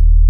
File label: Bass short